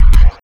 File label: Zappy Kick